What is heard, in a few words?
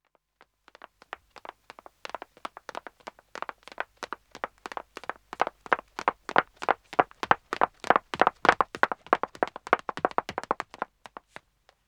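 Wood (Dead Acc) Running 2 women; Footsteps; BBC Sound Effects Library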